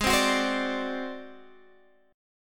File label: D 7th Flat 5th G sharp, chord